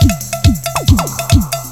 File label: 138 DISCO, Disco House; USB Soundscan vol.20; Fresh Disco House I; AKAI; DISCO ADD